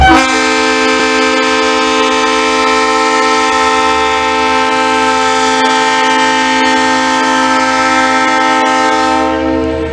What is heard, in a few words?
horns; sjvr 442rs3l; run8 v3